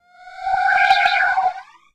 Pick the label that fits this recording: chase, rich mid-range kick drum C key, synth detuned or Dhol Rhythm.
synth detuned